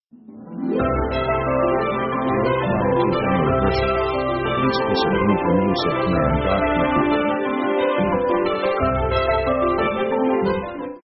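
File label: orchestra big cheerful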